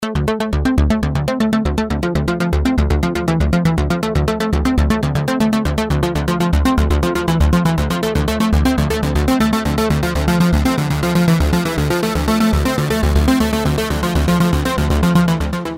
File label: Saw ARP; SharpSawer